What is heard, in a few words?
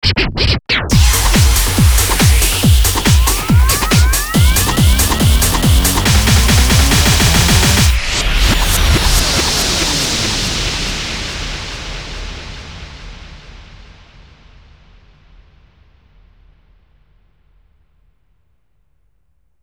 Goal; Max Anarchy; Anarchy Reigns